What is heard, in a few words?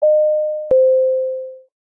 dingdong, elevador